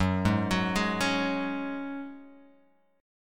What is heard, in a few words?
Gb Suspended 2nd, Guitar Open G